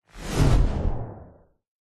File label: sound design whoosh fast futuristic collapsing